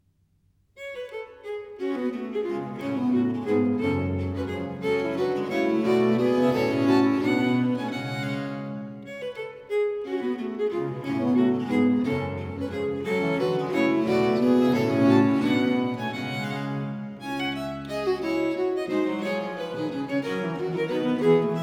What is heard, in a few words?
suite no. 5 in four parts, in g minor, viols